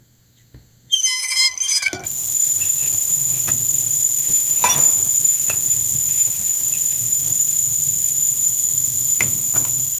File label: realistic sound effect of, cleaning